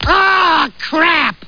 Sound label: lose; Passatempos; Bloobs